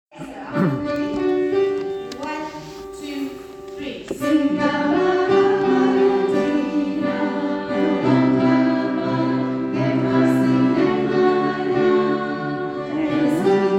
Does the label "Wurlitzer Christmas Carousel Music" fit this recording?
No